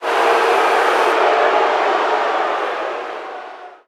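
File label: Basketball Crowd Boo; Crowds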